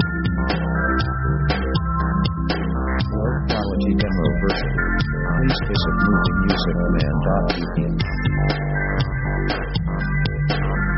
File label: Eighties Pop; synth themes